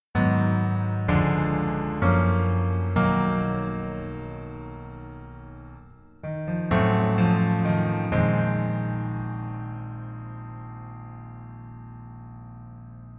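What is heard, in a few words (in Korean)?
Piano version 멜로디MR 남자키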